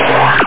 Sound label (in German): Treffer; startrek-helm-spot